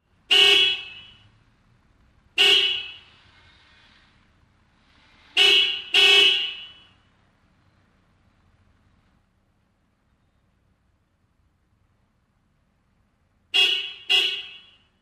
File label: 1980 Car Horn Toyota